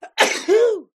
Sneeze

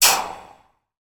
water sizzle hot knife handle placed in water; sound effects one

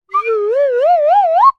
cartoon slide whistle ascend

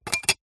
foley glass thin small sheet pane down on surface

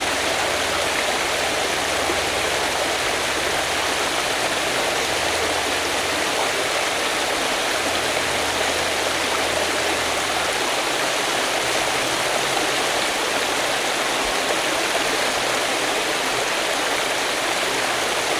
water waterfall multi step fast flowing

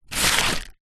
foley paper pieces small pick up; zapsplat

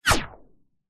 whizz slow mo; sound design; zapsplat

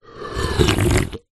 human sip soda from can

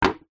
household chair small wooden down on hard ground

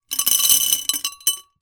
leisure marbles lots pour into glass container; zapsplat

